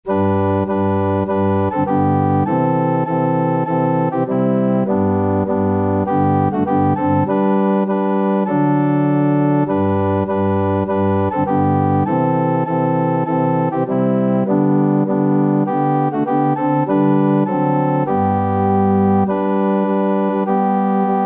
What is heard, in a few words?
what a fellowship, organ